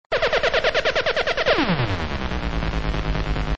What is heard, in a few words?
Laser